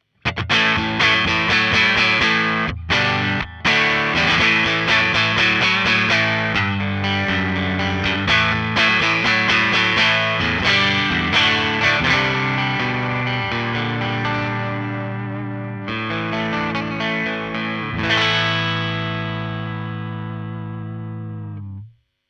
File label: ir clean; valeton omni